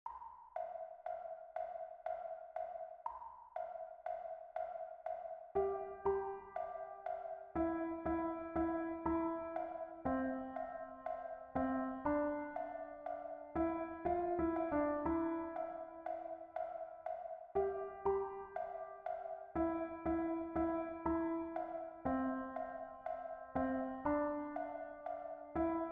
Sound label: melody, gitarre; deutsche Volkslieder; Am Brunnen vor dem Tore